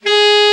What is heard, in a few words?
Alto Sax; Sound & Vision - Gigapack II CD 1